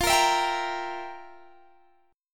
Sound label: F Major 7th Suspended 2nd Suspended 4th, F, down, Guitar, Open D